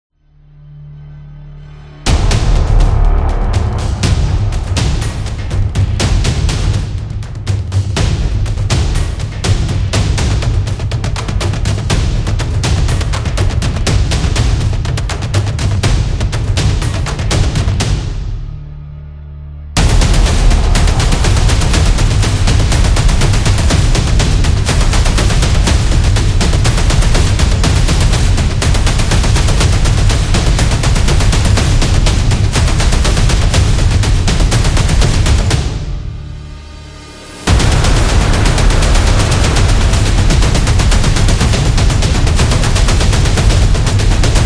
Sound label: War Drums; battle drums